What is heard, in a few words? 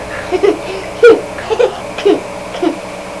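boy giggle; People; Sound Library 2000; Wayzata Technology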